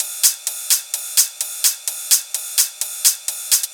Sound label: Hihatloop